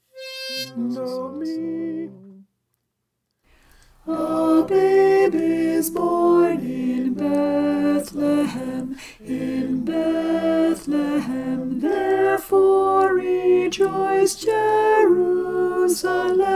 a babe is born alto

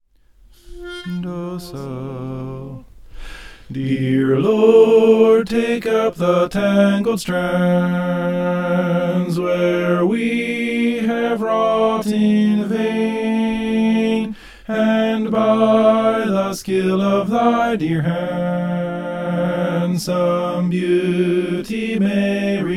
soprano

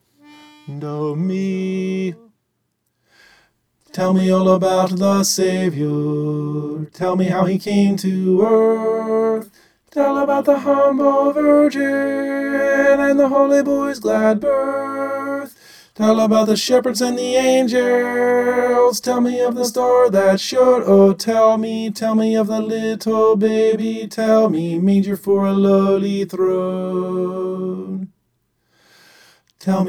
tenor

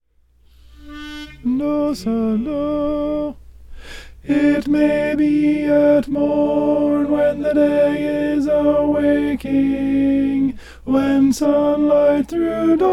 alto; it may be at morn

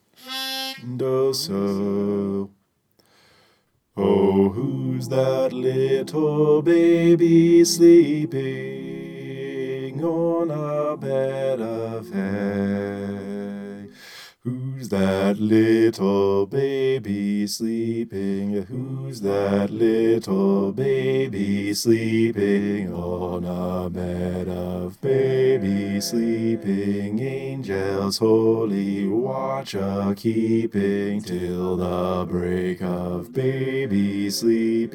bass

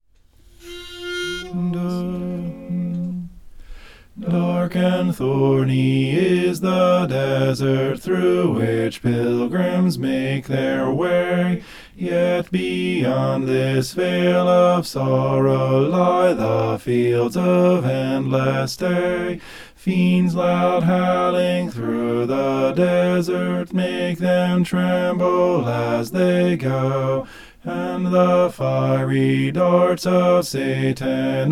bass

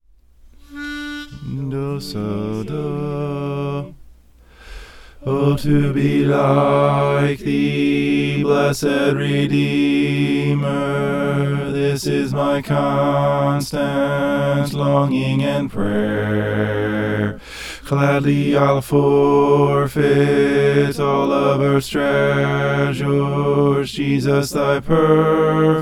bass

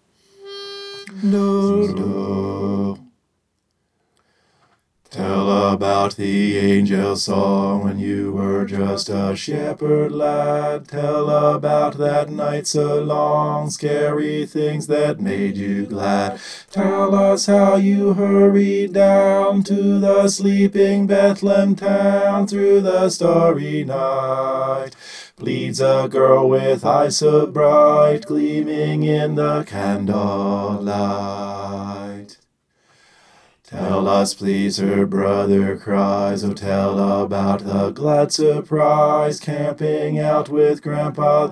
through the starry night bass

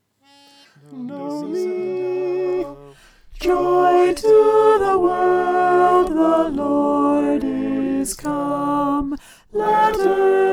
joy to the world alto

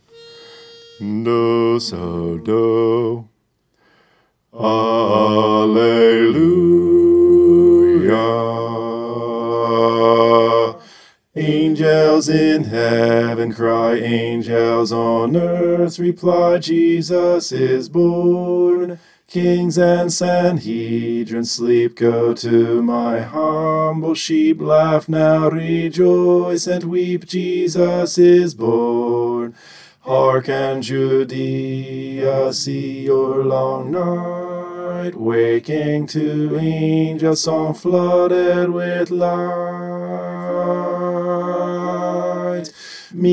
bass

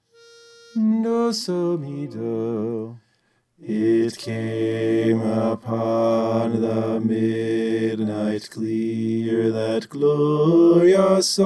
bass

